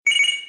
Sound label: pow; Ben 10 - Tomb Of Doom